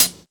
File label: short hat sound c key; hat samples